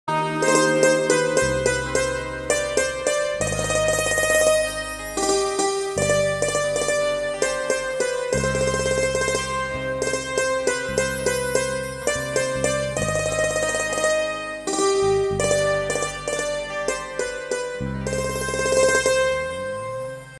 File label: saxophone cover, romantic song